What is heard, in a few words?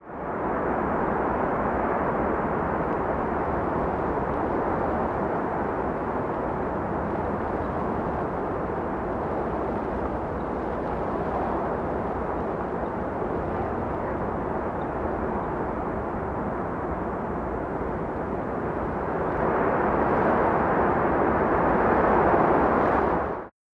Canyon; Christtheredeemer